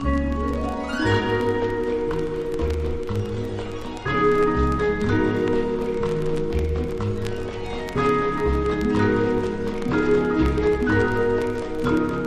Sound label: NEW PERCUSSION ENSEMBLE; EXOTICA